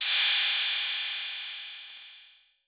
808, CYMBAL; CYMBAL P2

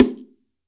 BONGO, STEREO BONGO; Cuba; AKAI S6000 CD-ROM - Volume 5

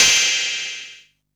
Hat, Drum & Bass; AMG - Prototype Drum & Bass; Hats & Rides

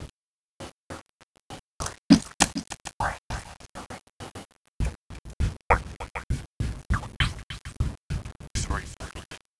Envelope Beat; Drums & Drones

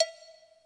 cowbell, Kurzweil K2000

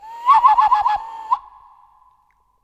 Bird Whistle; African; SFX; Quazibeat; African Secret

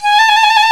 FLT FIFE, British Fife, Fife Trills

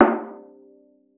VIOLA FINGER; Xsample VOL-5

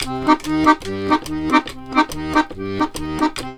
140, Complete Accordions

